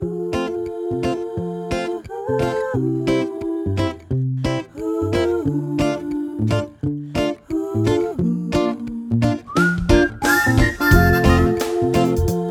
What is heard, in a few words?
MUSIC ONLY; SUNFEAST Cookies